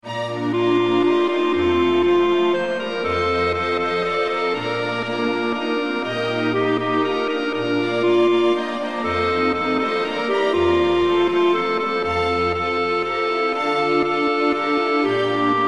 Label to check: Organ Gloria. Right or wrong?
Right